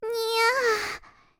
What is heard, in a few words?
nyahh